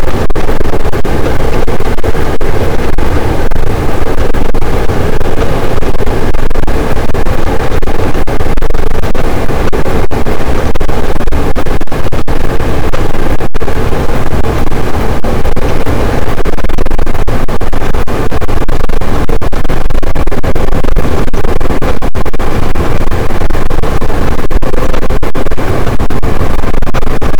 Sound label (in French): cachalot positif, boat, Physeter Macrocephalus